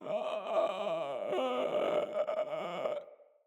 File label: Infested Runner Patrol